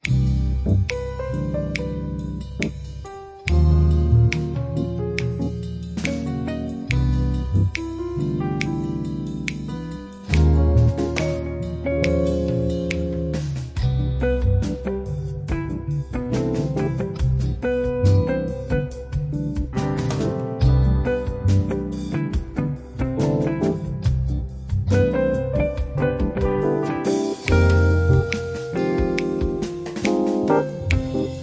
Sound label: bgm